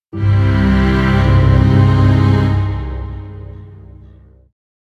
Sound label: Puzzle Complete Jingle; Metroid Prime Federation Force soundtrack